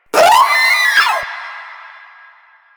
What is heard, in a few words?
Runner Vocal Short Attack; Enemies; Warframe; Proto Infested